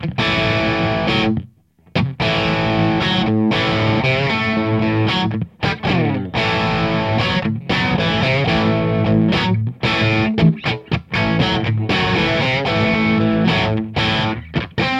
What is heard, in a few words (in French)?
rock crunch rythm